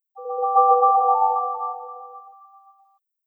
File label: Wii Menu Startup; Wii System Soundtrack